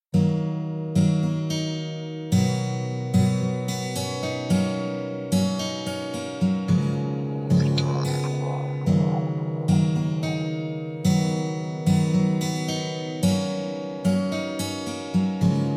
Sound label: Guiter Trap Soul; Scottbeatz